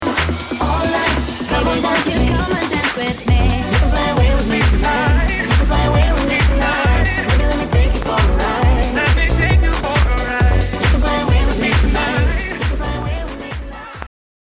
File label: BPM135